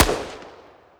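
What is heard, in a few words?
fire distant, flare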